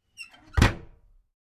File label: Metal home door opening and closing